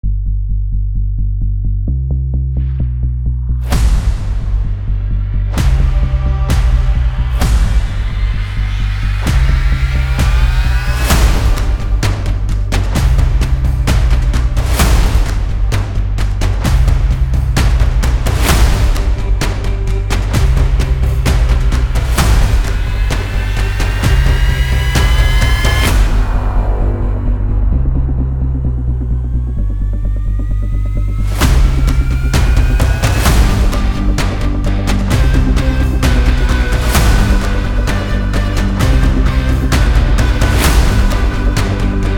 inspiring motivational cinematic trailer

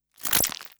Glitch, noise

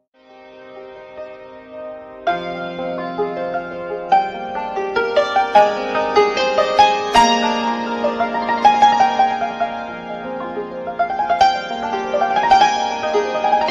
Santour; سنتور غمگین

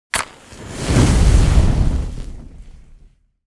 big fire burning; atash

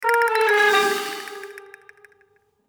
Cartoon, transition

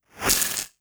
Glitch, noise

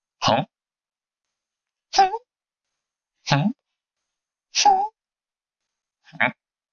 surprised huh hmm hwaah comic

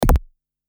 Game, transition

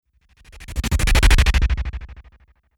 Strobe, transition

